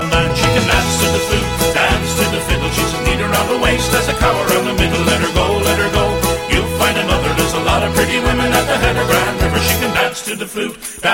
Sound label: irish dance